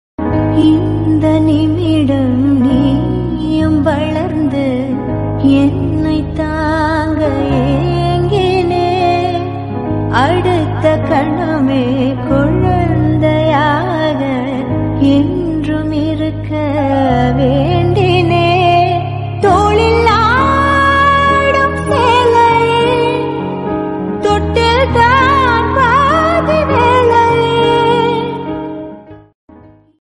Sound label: sentiment tamil song